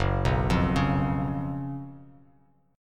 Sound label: Gb Major 7th Suspended 4th Gb Bass Standard; GbM7sus4; arpeggiated; 2,2,3,6; JGuitar